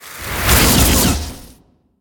Hero Deal Damage; arcane

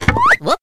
symbol pass; jingle spin

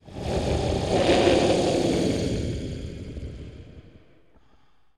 growl; the wolfs night

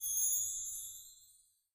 scatter Complete; jack and beanstalk

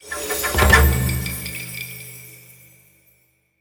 win Small; templeofnudges

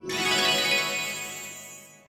fairy Shine; fairymirror

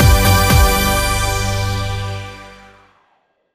big Win Loop End; dazzle

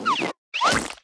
crush fruit; fruits

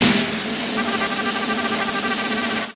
near Win Riser; scruffy duck

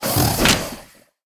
win Croc; jumanji

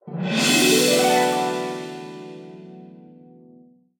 free spin retrigger; wild turkey

